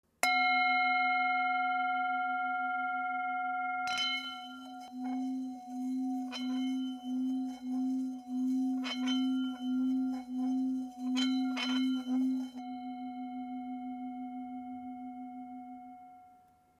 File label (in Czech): tibetska misa